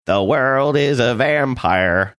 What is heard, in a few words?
singing